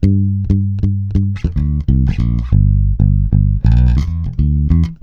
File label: Ultra Freakin' Bass, 96 BPM; Sampleheads